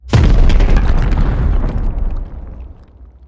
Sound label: skill poison boom